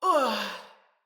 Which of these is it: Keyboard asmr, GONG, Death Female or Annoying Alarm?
Death Female